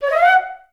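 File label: flute pickup F#4; Best Service; Peter Siedlaczek's Advanced Orchestra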